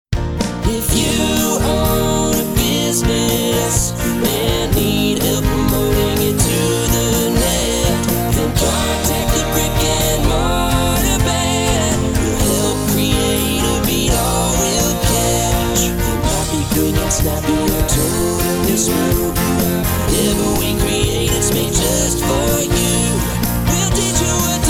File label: radio